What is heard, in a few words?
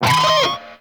Pistol Low Clip; Weapons; Warframe; Tenno; Temple Signature